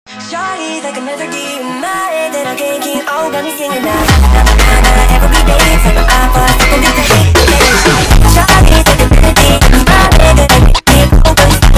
funk Sped Up

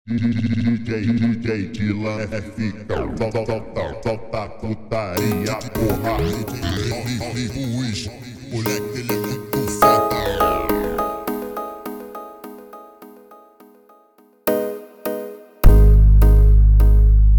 Funk instrumental Slowed

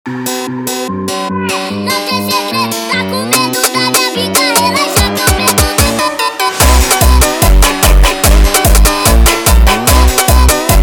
Funk sped Up, funk

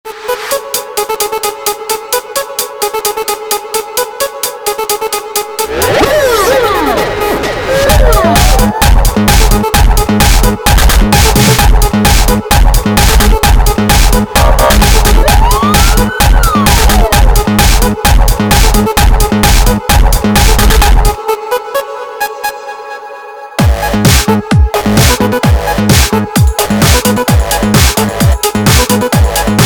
Triangel Violin Classic hardtekk, funk remix

